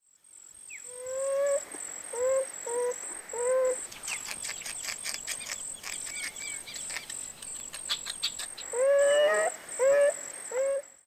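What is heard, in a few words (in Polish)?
żeremie, głos; multigrafia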